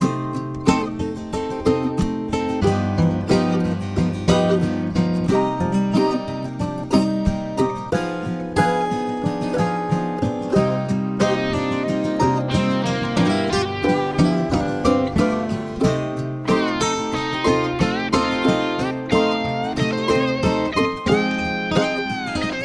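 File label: Guitar music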